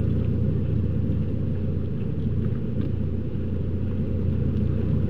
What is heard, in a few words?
harbor ambient, hongkong; ambience; Soldier Of Fortune II Double Helix; XBOX